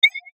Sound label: Beep Tone Signal Multimedia; Web Interactive